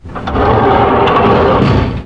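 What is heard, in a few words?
elevator door; blackops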